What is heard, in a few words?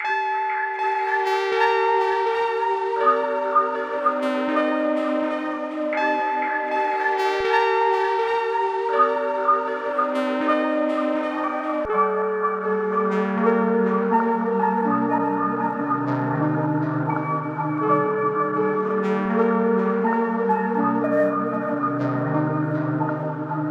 162 BPM F# Min, trap melody loops; Cymatics; Nightmares Trap Melody Loops